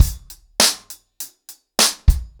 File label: 100BPM, ACOUSTIC DRUMS; Sonokinetic - The Roots Drumloops